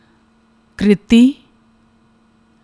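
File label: Kriti, Carnatic Music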